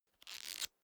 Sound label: small book riffle through fast